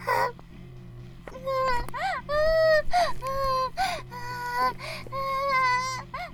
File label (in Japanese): バイブ放置; イクたびにわけて